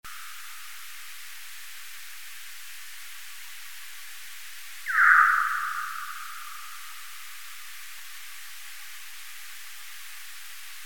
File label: Tadarina australis